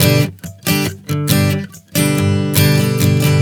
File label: Strum 070 G, One Chord Strumming 070 bpm; Sonic Foundry (Sony Creative Software); Songwriter Acoustic Guitar Companion